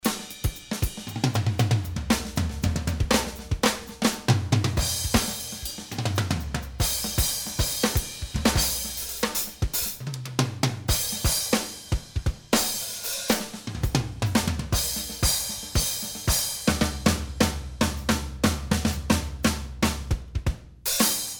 DRUMS COMP BYPASS

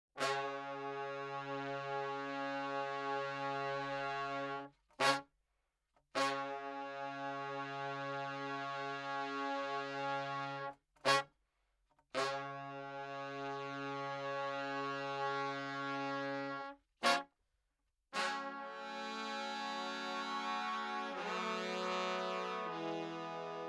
Horns; ITB mix

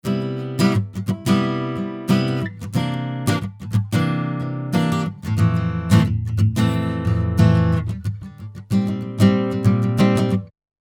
acc gtr bypass

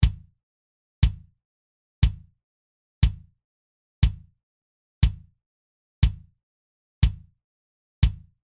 Kick Drum Bypass

